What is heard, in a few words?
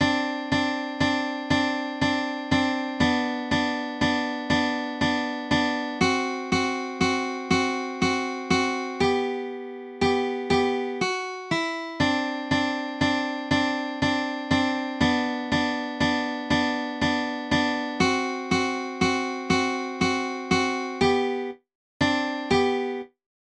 chopsticks guitar